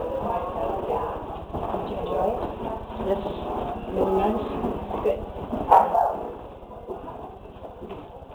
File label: Dog bark